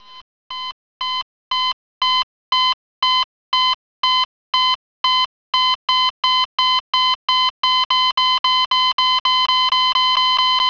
beep

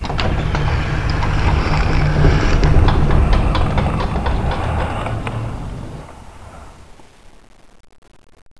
alien door

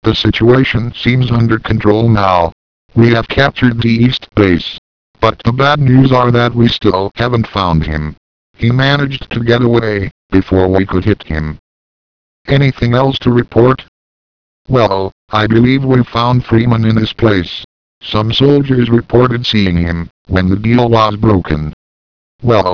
grunt

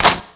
shotgun close barrels, weapons; unreal; quad

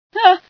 scream, female sci

